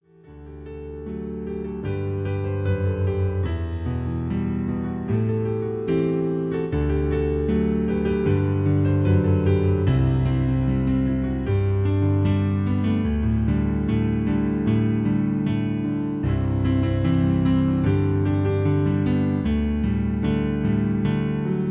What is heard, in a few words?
My Piano Version